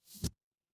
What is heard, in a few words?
ui modal close